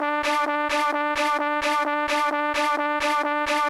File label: kawai k1 OMNIBUS LOOP; wavetables